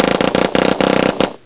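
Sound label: machine gun; robotshot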